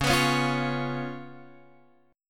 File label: C sharp 7th C sharp, Guitar Open F; C#7; strummed; JGuitar